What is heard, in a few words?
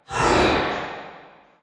lil haunt chase